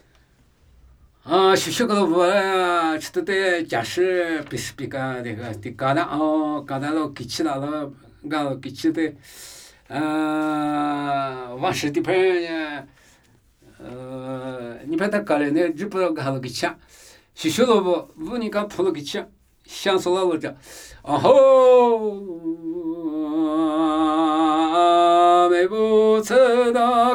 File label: Namuyi Tibetan Ritual Chanting